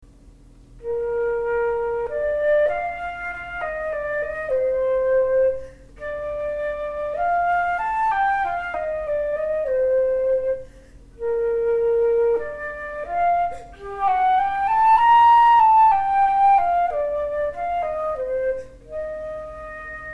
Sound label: flute